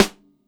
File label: Snr, drum